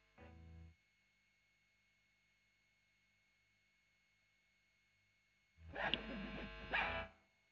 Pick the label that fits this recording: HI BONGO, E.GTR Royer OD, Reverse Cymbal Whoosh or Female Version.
E.GTR Royer OD